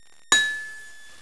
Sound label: Ping; ProMaker Utils